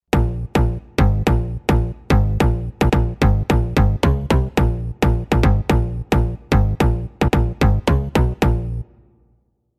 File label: BASSES SLAP IT